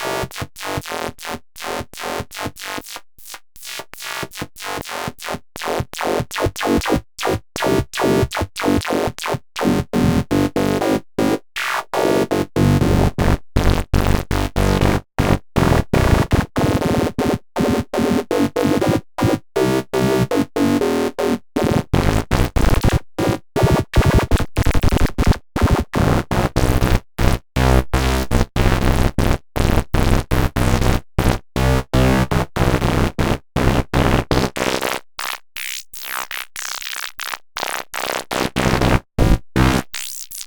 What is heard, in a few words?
Filter Mod